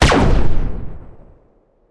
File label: shoot lazer